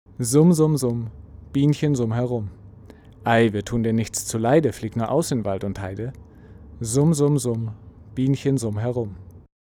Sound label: Isovox Mic No Screen Vox 15cm Background Noise